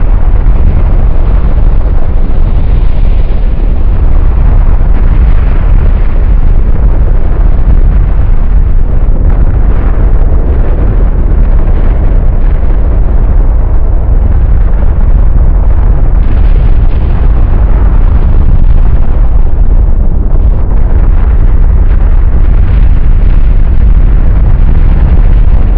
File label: thunder ambience; gauntlet seven sorrows